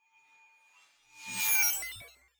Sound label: fly by drone digital